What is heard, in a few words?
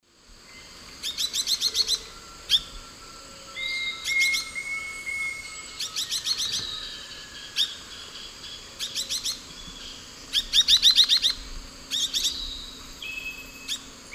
Euphonia rufiventris, calls and song 2-3 birds; S. America; Eastern Lowlands